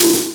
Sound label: Simmons SD-8